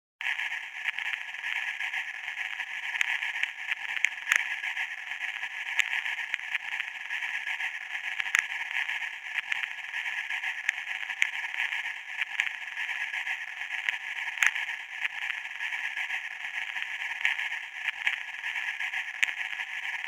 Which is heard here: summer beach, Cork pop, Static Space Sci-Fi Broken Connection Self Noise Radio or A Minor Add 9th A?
Static Space Sci-Fi Broken Connection Self Noise Radio